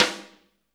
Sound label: snare JAZZ, Roland; Roland L-CD701; Snares 4; Sn Modules 4